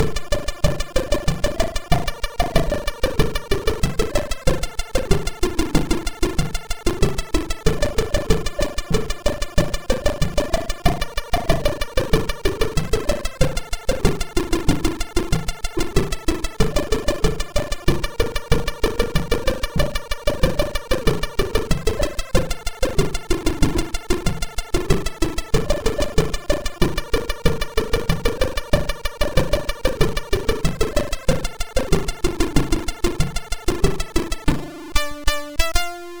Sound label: MOS6581R4, sid